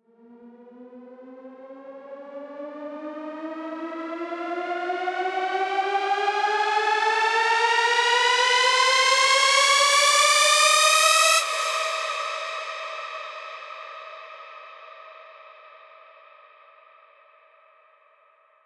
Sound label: fast approaching high rising